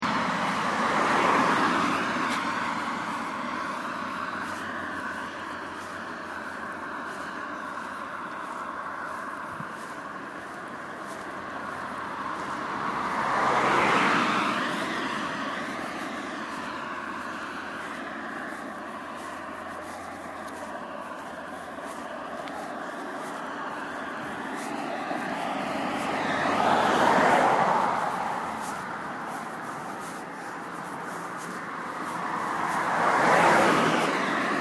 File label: Electrified Environment